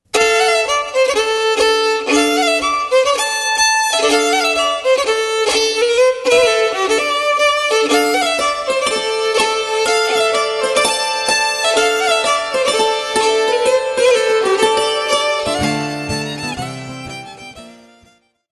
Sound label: Bell Country, Acoustic Celtic Music